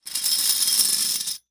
Foley Spurs On Concrete

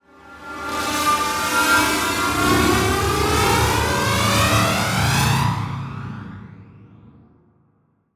Transition Tremolo; Production Element

